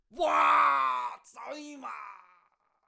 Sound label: Death Vocals; Taekwondo